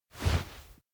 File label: relief shrug; awayuki; anemoi